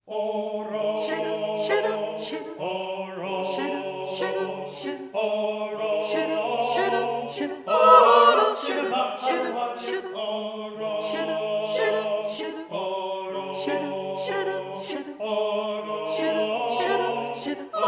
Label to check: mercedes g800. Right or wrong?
Wrong